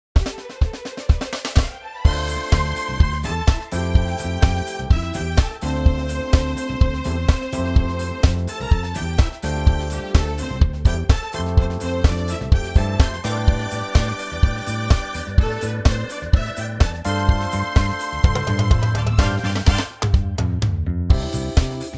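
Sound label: C No Gtrs, male; no solo; key - C - vocal range - A to A (optional C and D); soul classic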